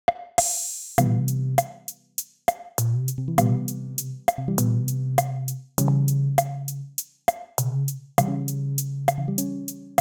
Bossa Town

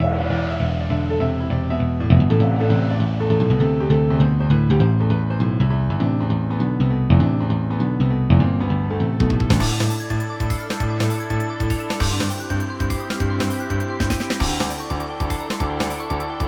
jazz

